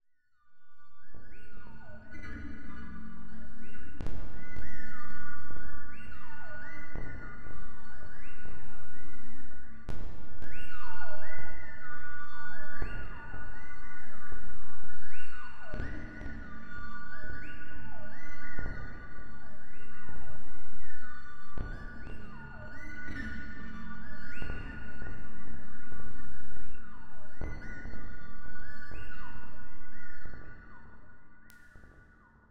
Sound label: Magnetic Field; 99Sounds; Magnetic Fields